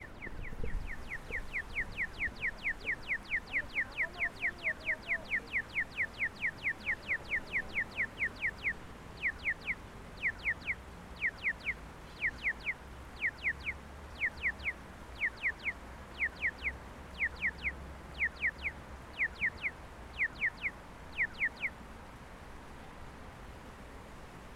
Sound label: Madrid Crosswalk Signal; Sirens and Crosswalk Signals